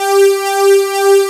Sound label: SYN G5; Best Service Gigapack 1